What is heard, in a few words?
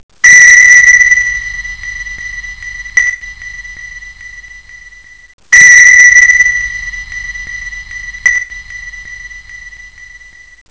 sonar ping